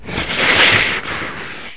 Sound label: gas; VORTERRA